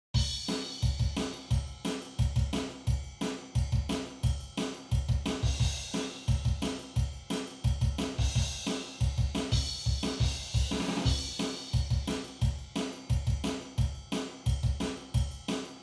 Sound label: Reverb Only